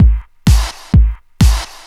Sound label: Clubhouse Loop 128 BPM